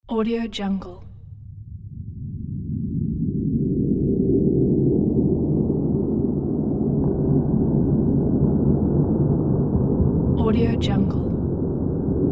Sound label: gloomy ambience